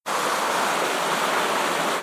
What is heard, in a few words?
Carpet longer; john-travoltage